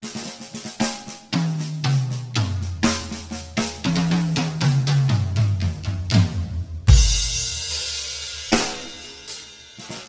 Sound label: Drum Solo